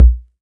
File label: sharp kick sample b key, kick one shot tuned to the B note. Loudest frequency: 84Hz